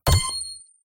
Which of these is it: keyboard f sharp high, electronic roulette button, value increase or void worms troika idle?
electronic roulette button